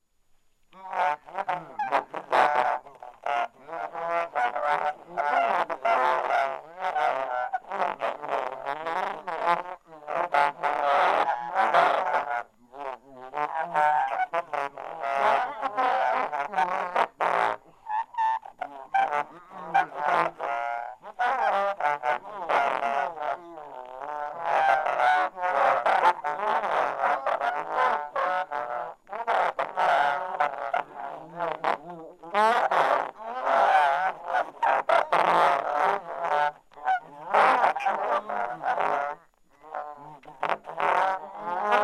trom bassoons

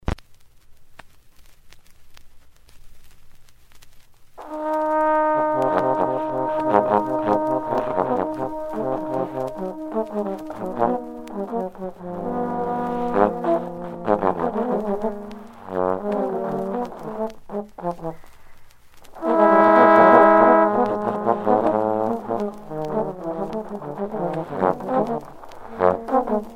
Five Trombones

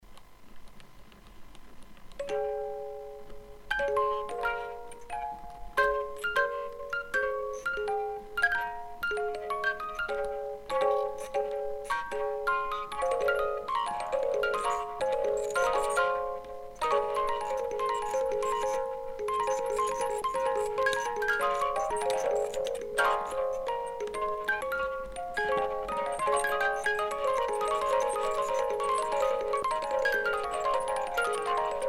music box study

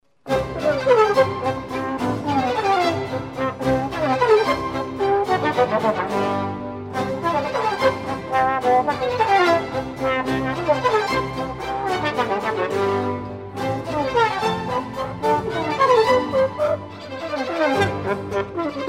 trombone; vivaldi sinfonia, cmaj, rv111a, 3. presto

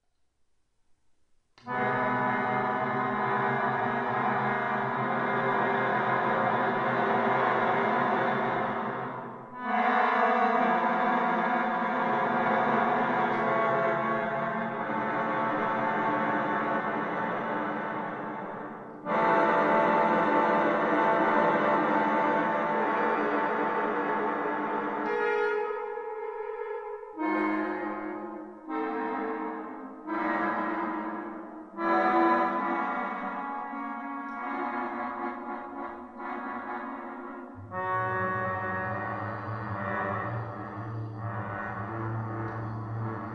melodica

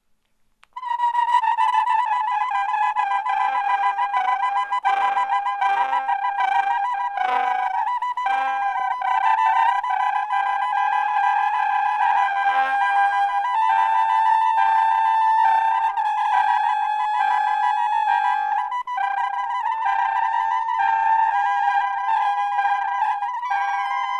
trom bassoons

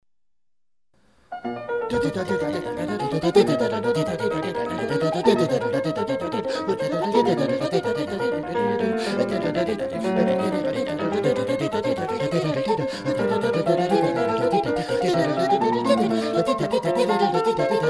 2 octave voice practice; bach; allemande

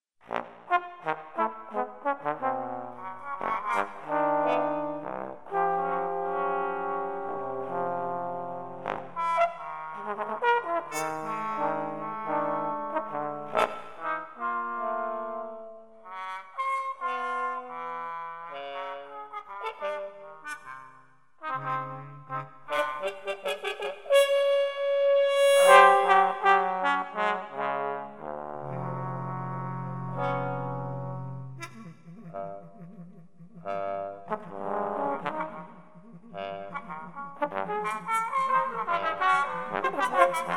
piano and orchestra; stravinsky

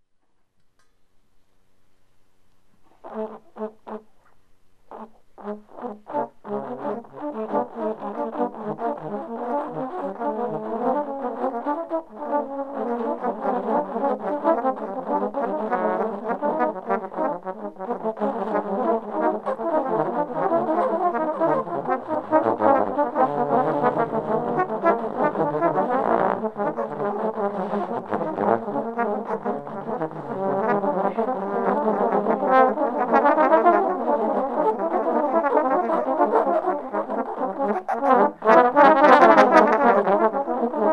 10 Trombones

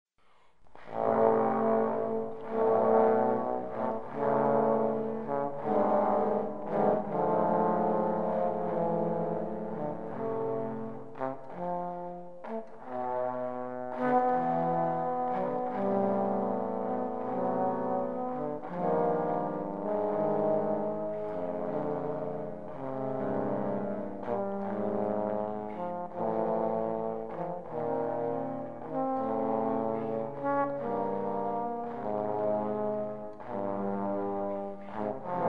piano winds intro; stravinsky

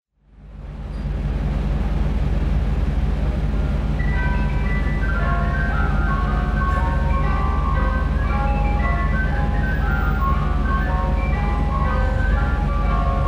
eyescream truck

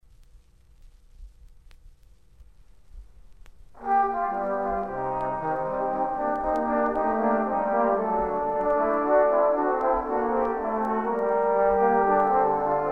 bach puer natus

